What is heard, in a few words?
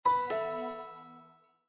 Windows Logoff Sound